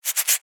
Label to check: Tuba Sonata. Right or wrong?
Wrong